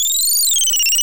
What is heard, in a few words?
Retro Computer Code Signal